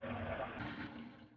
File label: condensing